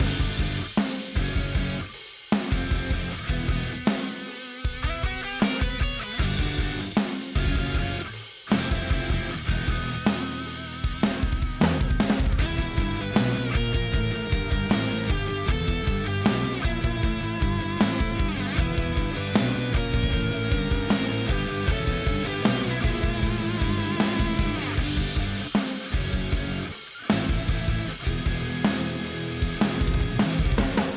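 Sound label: story dialogue loop; Turbine Fighter; Animoca Brands; Android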